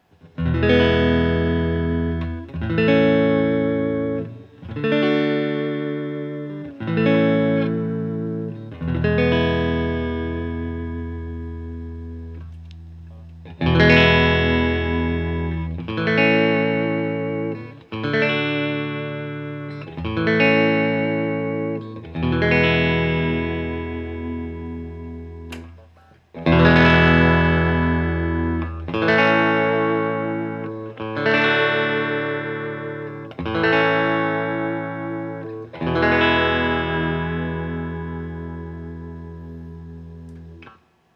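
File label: Guild X500T Open E-A-D, guitar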